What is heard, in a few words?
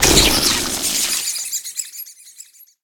bpop; WorldOfGoo; Beauty